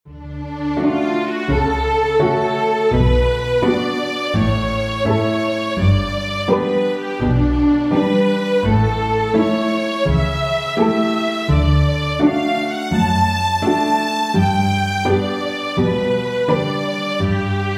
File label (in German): halb vier uhr blues